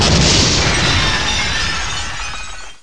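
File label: glass die; duke nukem total meltdown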